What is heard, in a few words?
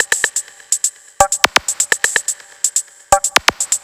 Percussive